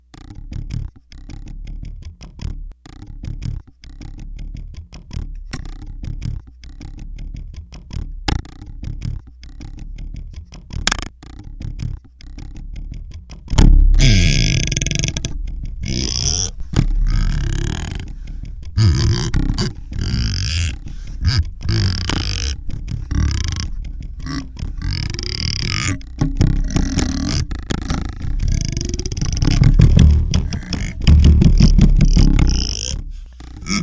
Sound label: Daxophone; played it with a comb; The bow had no rosin so I played it with a comb